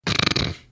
snorting

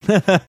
short chuckle

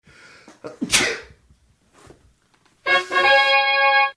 sneezing fanfare

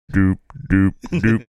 singing doop doop doop

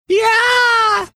imitating wilhelm scream

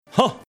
"huh"

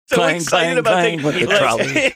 singing

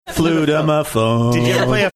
drawn out flute a ma phone

